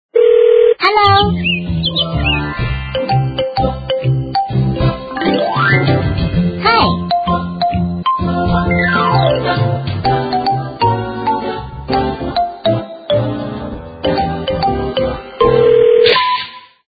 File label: ringtone; Gionee; GN708